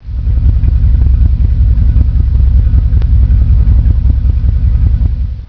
rover p5 v8 idle

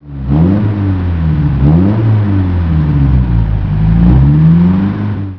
morgan

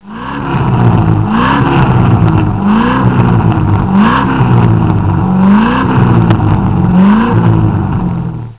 rover p6

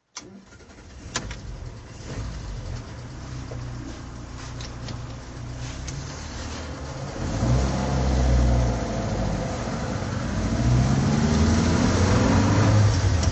triumph tr4 drive

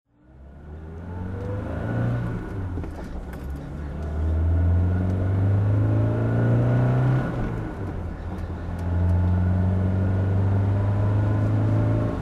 bmw 2002tii driving

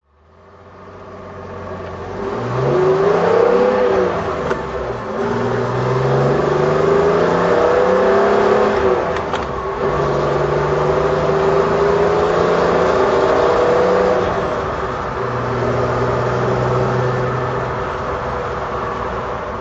220se drive

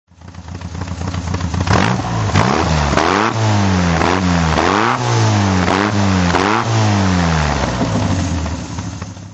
morgan dhc throt

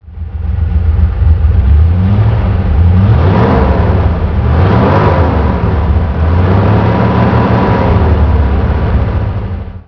rover p6b

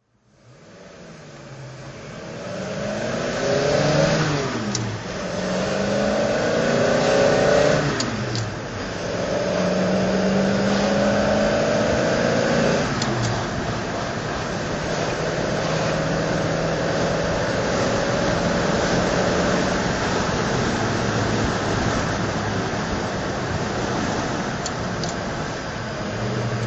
bmw 503 drive

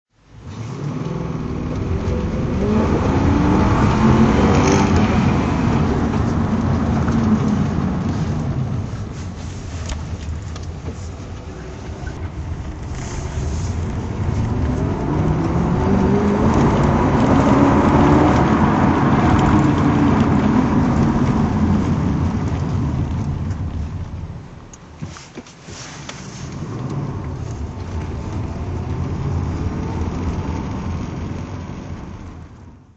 mg sv-r drive

